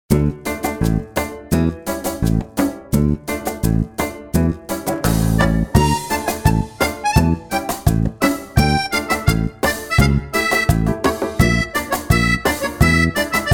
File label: Cumbia